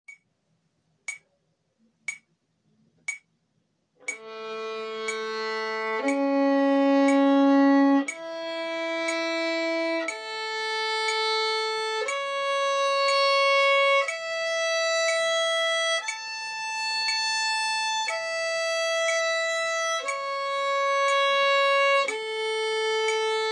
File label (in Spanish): Arpegio de la mayor con metronomo